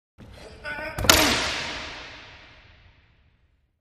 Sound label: Racquetball; Body Runs Into Wall With Vocal Effect